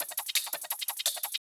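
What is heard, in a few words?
170 drum and bass Perc; Dance DJ; Percussion; Misc Perc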